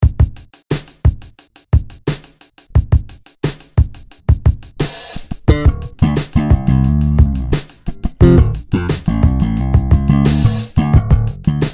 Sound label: slap solo